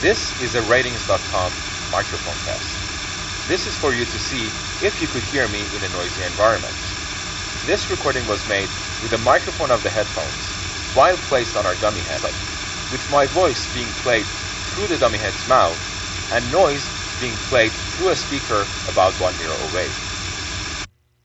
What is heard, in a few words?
internal mic noise